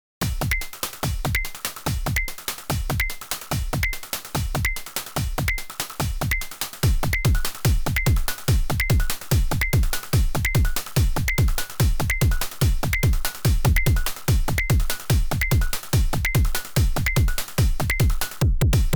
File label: drunk monky ish opl; weeklybeats 2024